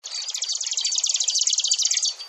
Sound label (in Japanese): osekka